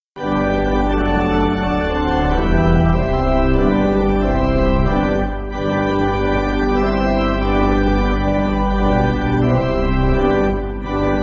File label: Pipe, church music